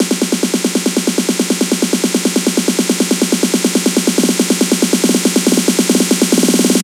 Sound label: Fills, Loops 140 BPM